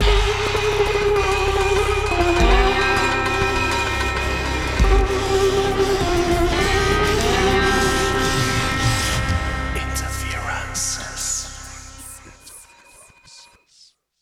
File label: Jingle